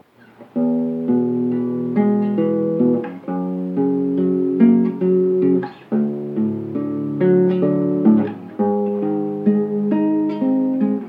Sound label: instrumental